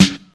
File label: old school warm hip hop acoustic snare f sharp key